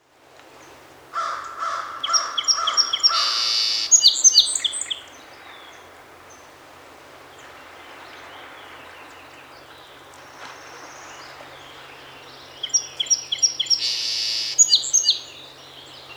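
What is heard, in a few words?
Song Sparrow 7 songs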